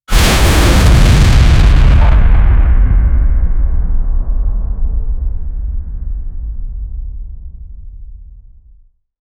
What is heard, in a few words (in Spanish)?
explosion